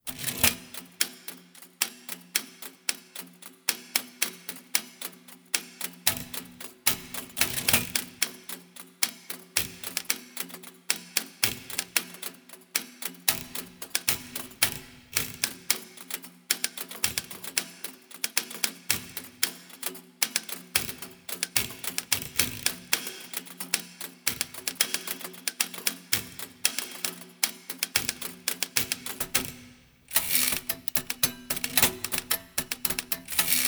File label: Typewriter Sonata